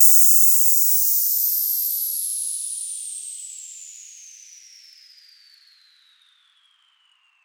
Synthetic Puncture

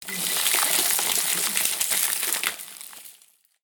Fast Freezing Ice

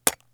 Egg Crash

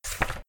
Page Turn

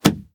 Book Close

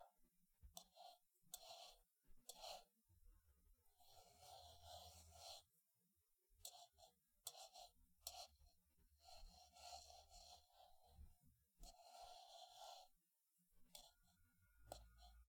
Mixing Sand In A Bowl

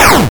Gun Shot 8 Bit

